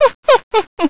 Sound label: Laugh; Vaati laughing; Zelda